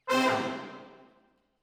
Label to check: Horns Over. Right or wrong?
Right